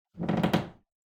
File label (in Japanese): フローリングがきしむ音